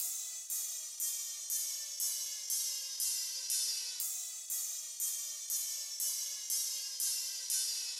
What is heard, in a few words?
Ride pitch down, Big EDM Drum Loops 120 BPM; Future House; Future House Tools